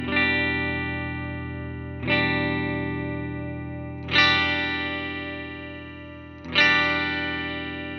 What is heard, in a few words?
guitar match tele; Positive Grid Bias FX 2